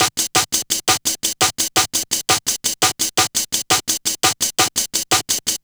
Kickin Break, Drum & Bass, Beats & Breaks; Fluid Dynamics

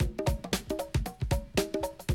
Classic Drum Loops

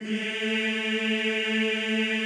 EEH, Classical Choir

